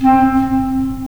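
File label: wind clarinet; LA Composer vol. 3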